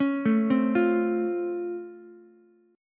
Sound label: C sharp Augmented Major 7th C sharp down Ukulele, chord; C#+M7; arpeggiated; JGuitar